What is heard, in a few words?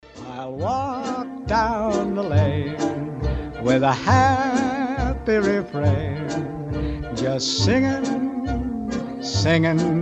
singing rain